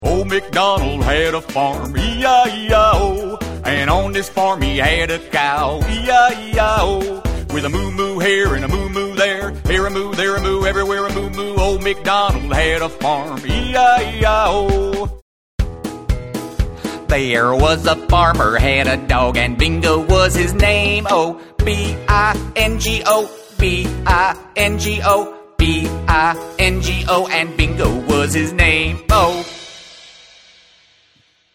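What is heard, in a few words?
American English, songs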